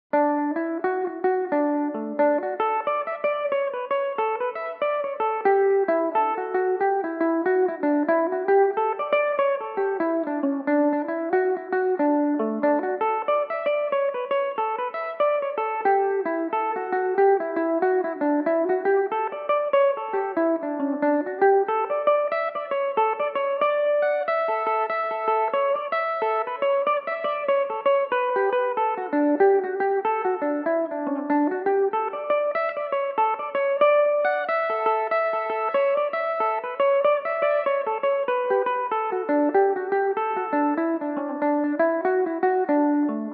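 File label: D major belmuse, irish mandolin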